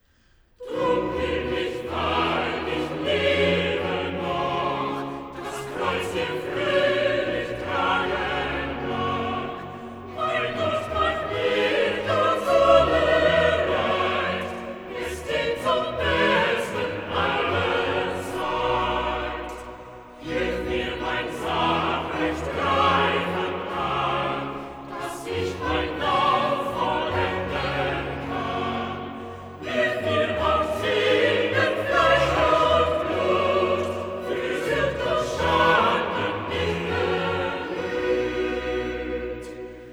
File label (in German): Choral; Sun After New Year; BWV 153 'Schau, lieber Gott, wie meine Feind'; IX. Choral 'Drum will ich, weil ich lebe